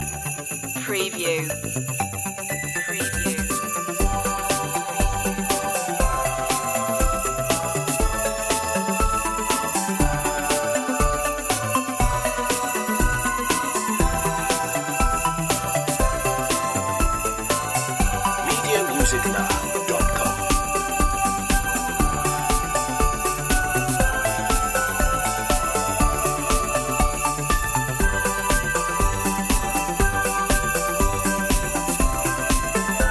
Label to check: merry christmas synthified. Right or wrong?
Right